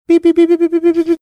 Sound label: making series beeping sounds